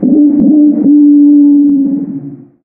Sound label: combine gunship alert; hl2